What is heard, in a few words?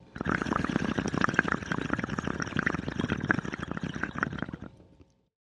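skunk marking territory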